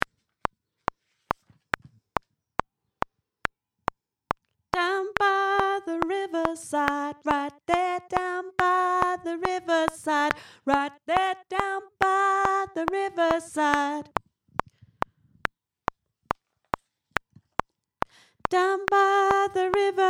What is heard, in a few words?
Alto, gospel choir; down by the riverside